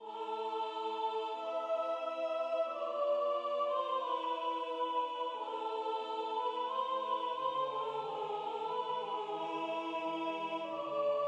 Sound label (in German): Schoenster Abestaern Sopran